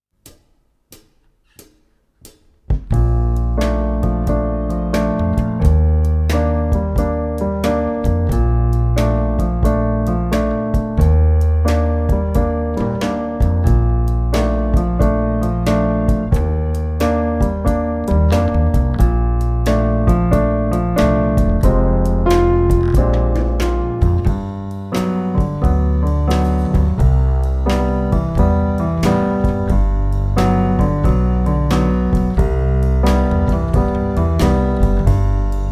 backing only, jazz wind; Winding Road

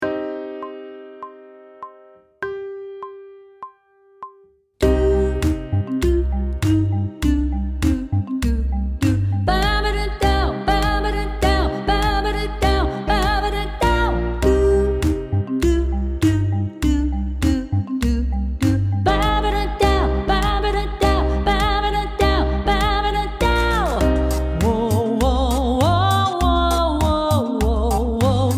Sight Singing with vocal, pop vocals; grade 6

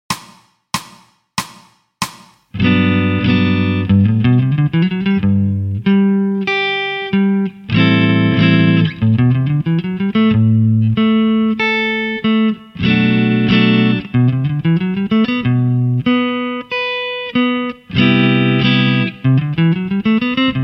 Technical Study, electric guitar; electric guitar performance diploma

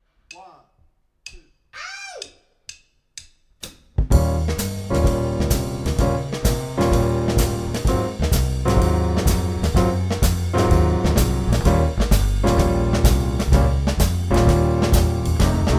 Southern Shuffle (backing only)

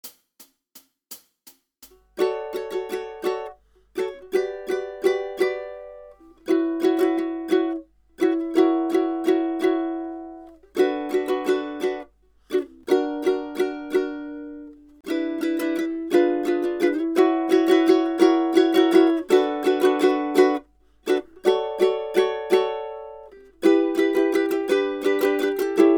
rhythm study, ukulele; grade5

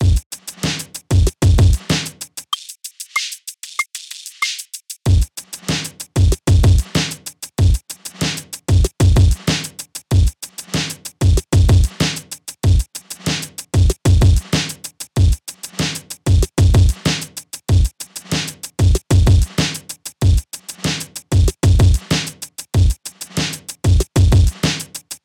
DJ Scratch Beat

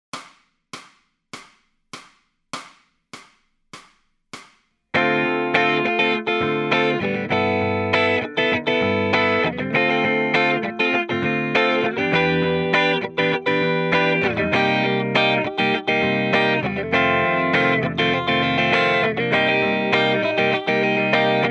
Lead Backing Track, electric guitar; Grade 5

